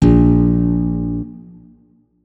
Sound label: notes, guitar; Hideout Furniture